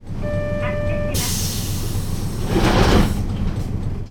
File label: door